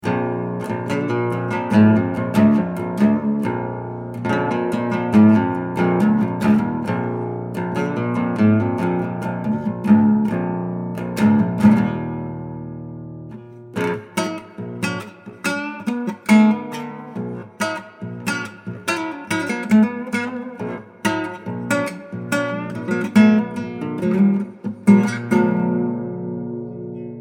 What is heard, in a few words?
Odd String Thing Nylon Twang